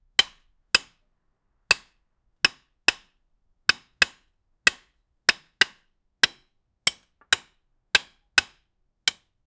objeto de madeira sendo, carpentry

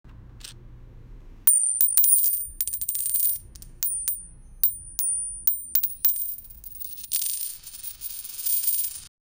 som de moedas batendo uma

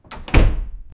porta fechando

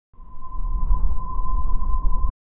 efeito sonoro de esgoto, underground

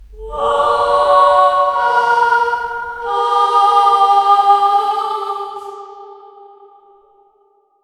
um coral de mulheres em, anguished

